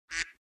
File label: quack; discord